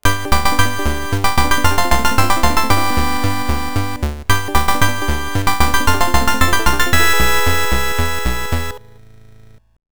Congratulant Fanfare; The Tower of Druaga; TurboGrafx 16

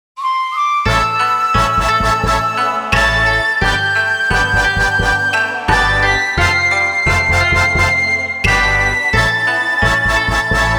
Victory Celebration Chant; tokyo ma jin high school sougakushou jin no shou

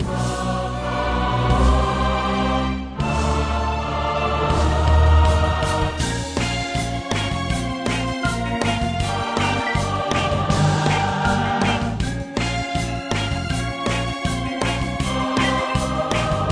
Music

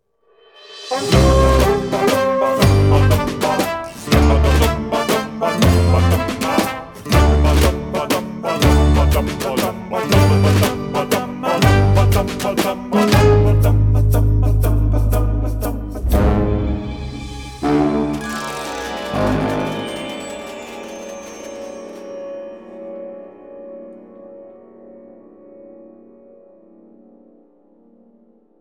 MX Fishing Village Set Sail For Adventure; burly men at sea ios macos ps vita ps4 switch windows gamerip 2016

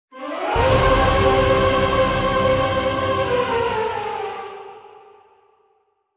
Jingle Shock

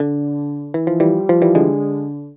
Tombstone Melody; Haven Forest

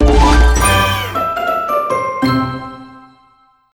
Crunchy Finish

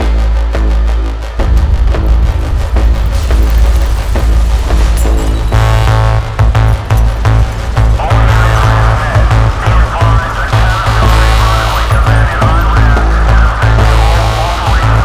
Earthquake Music Loading; Battlefield 3

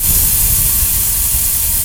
Gas; Zenonzard

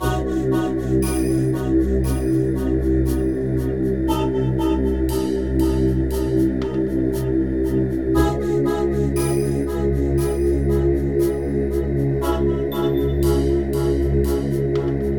Ominous; front mission 2089 border of madness

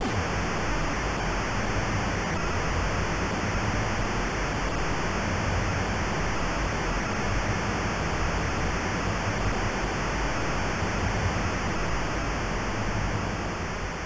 Loading BGM; Motos; x68000

